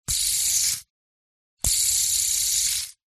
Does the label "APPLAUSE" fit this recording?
No